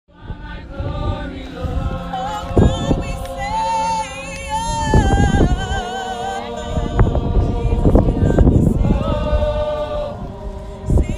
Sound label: singing